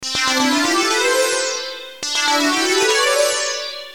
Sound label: SMS Ringtones